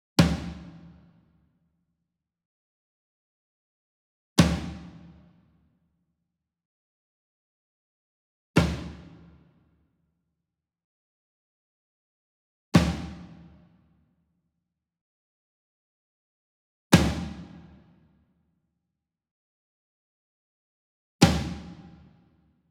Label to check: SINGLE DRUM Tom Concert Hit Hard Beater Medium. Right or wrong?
Right